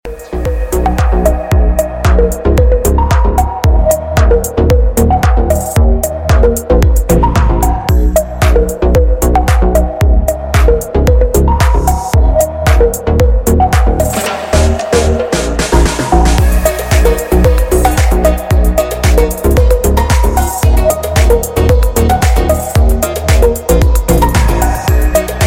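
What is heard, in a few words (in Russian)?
мелодия deep house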